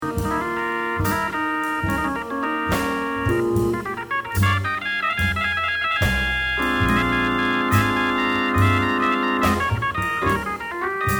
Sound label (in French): orgue hammond; cabines Leslies